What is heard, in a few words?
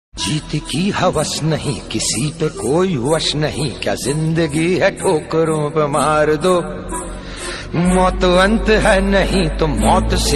song ringtone motivational ringtone bgm attitude